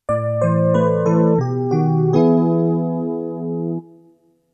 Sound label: jingle